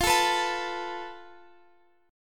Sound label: F Major Flat 5th, Guitar, Open F; FMb5; x,x,17,16,14,16; strummed